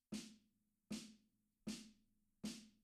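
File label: S602 BD2 mono Drums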